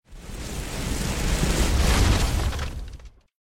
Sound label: scenario worm destroy; Adventure Time - Break The Worm; ThrillGames